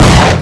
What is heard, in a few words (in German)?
Weapons Gewehr; RPG Maker; Wartools v1.14